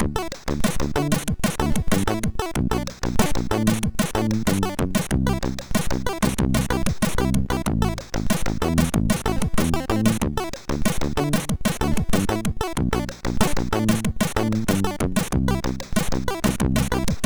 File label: Funny, MOS6581R4